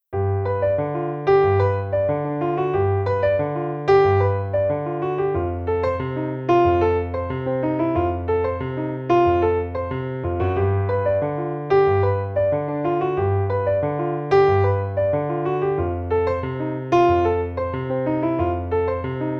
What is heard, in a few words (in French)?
Son, Piano seul; Jazz